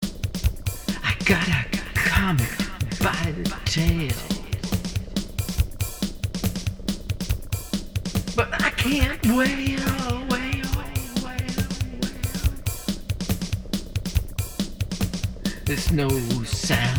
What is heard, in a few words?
Soundcheck